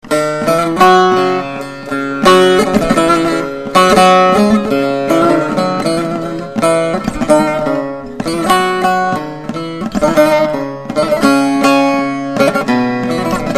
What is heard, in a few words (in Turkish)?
kirmizi gul demet demet melodi; Türkü melodisi